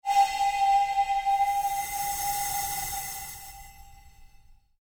pulse fade, Sound effects created by manipulating other sounds; Rises and Dives